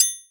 Triangle; Equipped Music; Loungin House

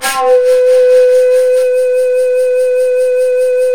SHAKUHACHI; World Combo

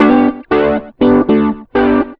rock, acoustic guitar loops; USB Soundscan vol.04 - Electric & Acoustic Guitar Loops; AKAI; 120B.ROCK

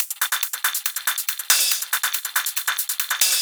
drum Loop 140BPM

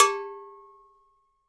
COW, NYC Percussionworks; Sampleheads; 90 s Sample CDs

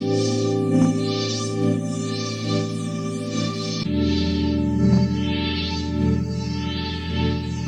Pad Loop 125, Loungin House; Equipped Music

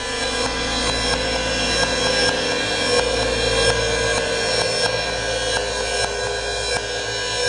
PROCESSED CYMBAL GROOVE; Sonic Foundry (Sony Creative Software); Vir Unis Infusion

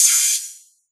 HH, Drum & Bass, 160 BPM; Ueberschall; Drum & Bass Flash Trackz

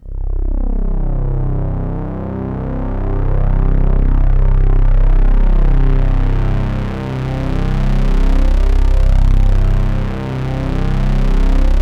PAD6 C2; USB Soundscan vol.05 - Explosive Jungle; AKAI

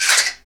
mouth, mouth klacks; Roland LCDP14 Africa VOL-2; Foot & Mouth

